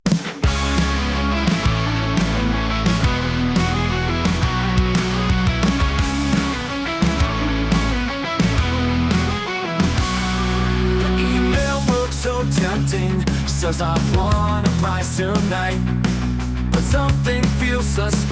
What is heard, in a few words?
ROCK; Cybersecurity Awareness Month 2025